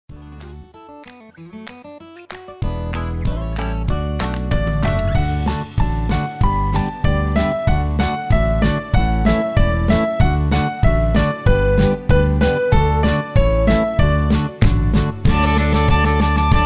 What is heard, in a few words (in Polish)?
wersja instrumentalna